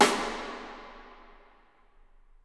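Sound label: snare drum rim, right; Miroslav Vitous - Percussion & Harp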